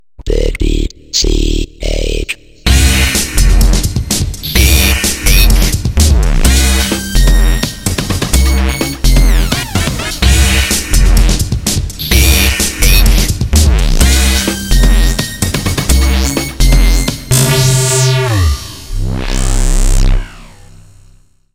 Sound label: jingle